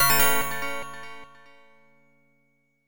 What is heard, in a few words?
Warning; Eye of the Beholder II: The Legend of Darkmoon; IBM PC AT